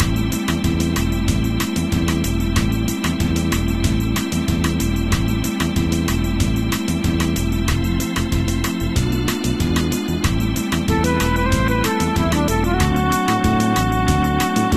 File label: bossa